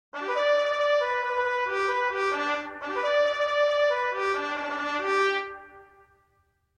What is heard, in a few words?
first call